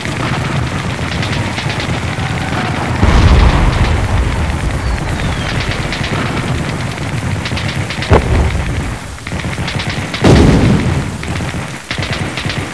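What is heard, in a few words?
rain war ambience; trust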